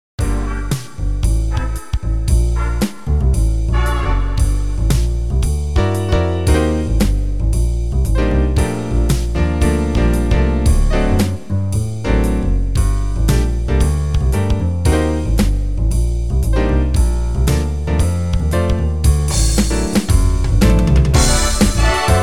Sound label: blues minus